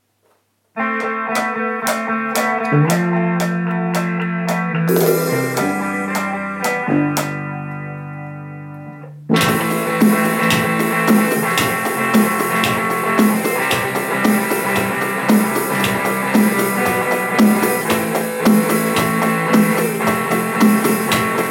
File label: 4/4 rock beat